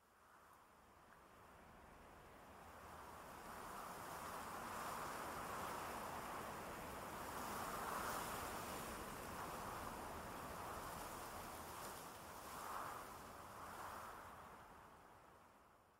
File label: tree wind; matmos hl